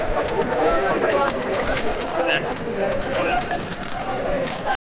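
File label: energetic chatter and clinking, crowd noise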